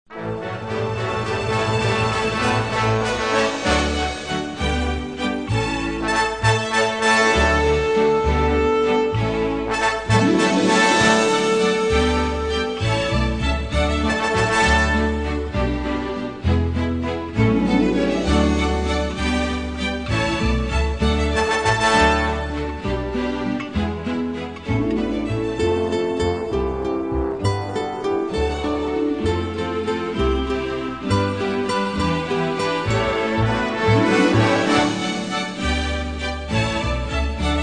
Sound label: Orchestra, Strings